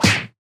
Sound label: Kick; Beat Saber Miss Sounds